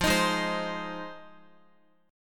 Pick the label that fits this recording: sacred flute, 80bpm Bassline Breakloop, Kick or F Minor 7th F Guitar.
F Minor 7th F Guitar